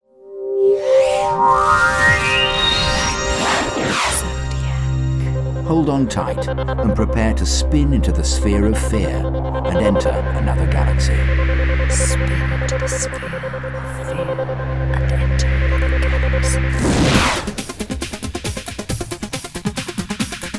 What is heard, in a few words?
Ride Start; Zodiac